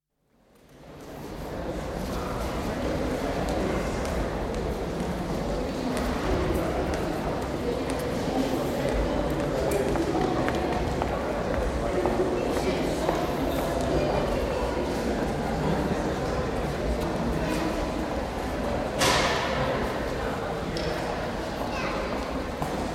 British Museum entrance hall, large crowded entrance hall with footsteps, chatter and distant telephones; Building; Entrance Halls; BBC